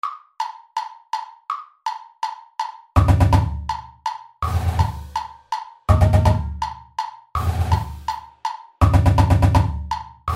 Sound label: ghost rolls click